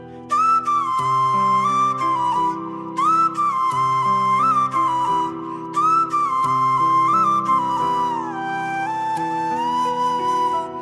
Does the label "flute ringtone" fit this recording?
Yes